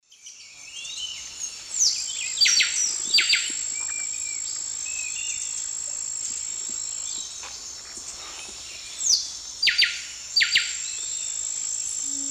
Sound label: Cantorchilus leucotis, song; S. America; Eastern Lowlands